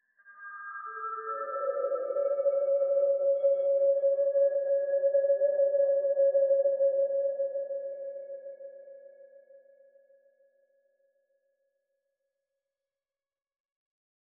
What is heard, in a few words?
vox ambience